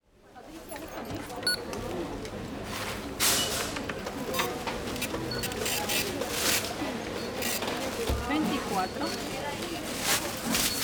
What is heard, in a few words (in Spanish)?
AMB INT SUPERMERCADO Caja registradora empaque monedas voces espanol